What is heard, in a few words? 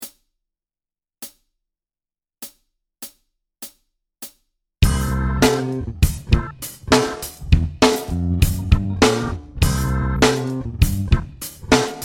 Funk no Guitar C 100, backing Tracks